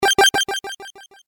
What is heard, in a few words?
Metal Gear